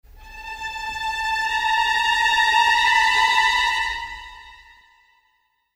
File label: dark forces appearance